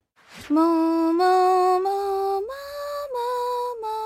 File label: mooo mooo